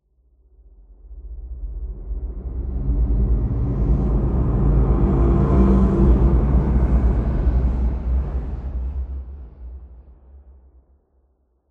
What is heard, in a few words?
amb unique lobby; Half Life Alyx